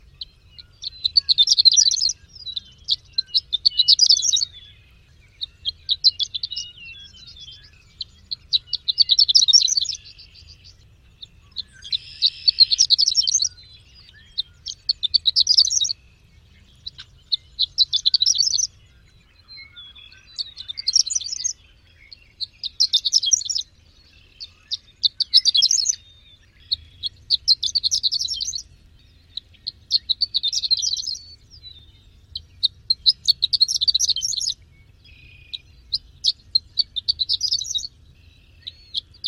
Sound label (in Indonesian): kicau master horned lark